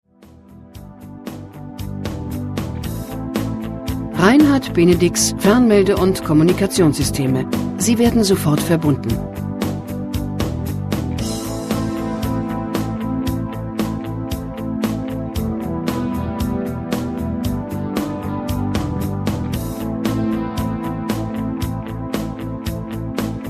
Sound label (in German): warteschleife; benedix